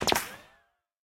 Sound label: bullet ricochet; War Thunder